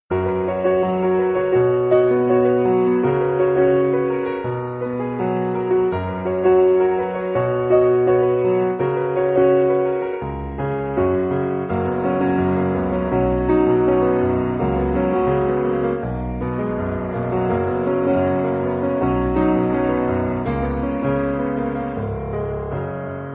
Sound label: romantic